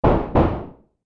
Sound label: knock